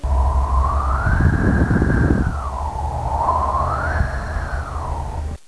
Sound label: Wind; 特酷声音素材库; 自然; 风声